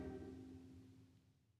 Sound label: Loop Tail; Good Job